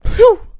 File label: Pig Relieved